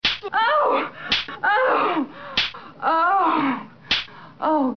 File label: slaps